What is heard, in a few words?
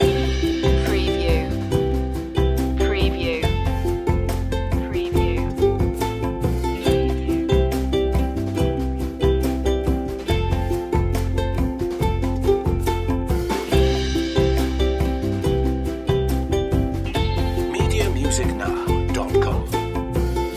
confident and happy loop